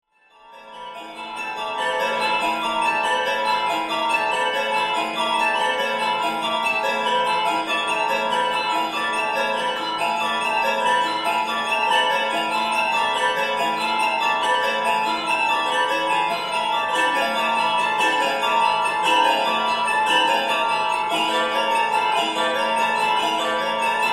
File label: Large Change Machine